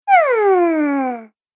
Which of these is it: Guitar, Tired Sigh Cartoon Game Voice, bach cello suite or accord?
Tired Sigh Cartoon Game Voice